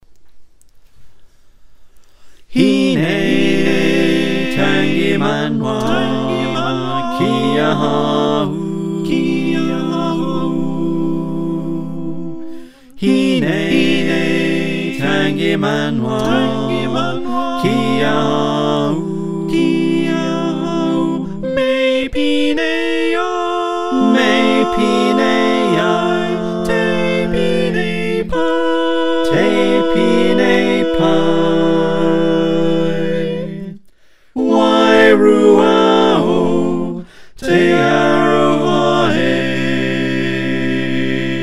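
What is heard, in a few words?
soprano alto tenor bass, choir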